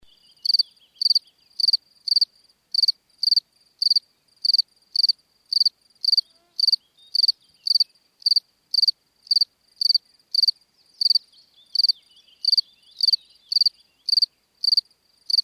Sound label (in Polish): świerszcz na łące